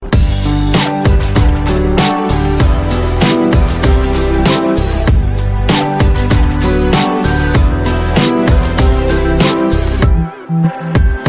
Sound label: ringtones; sony